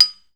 BANG; tools Bangs

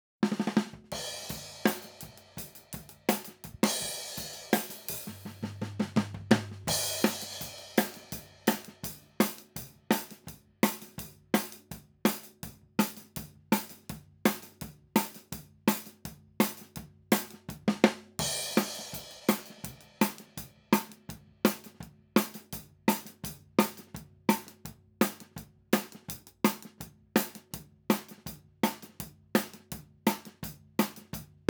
Hat Over; BMW Blues EWU

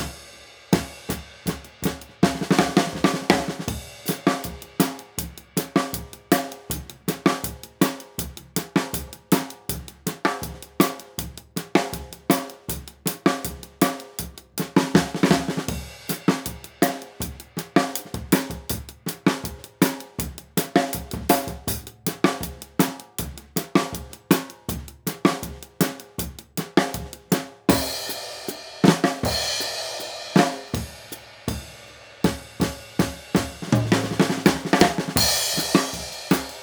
Hat Over

